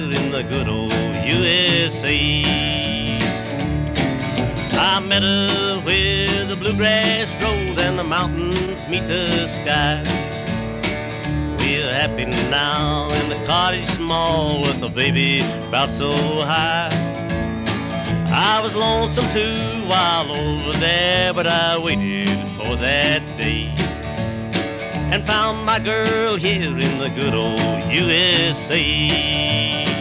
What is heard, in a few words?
country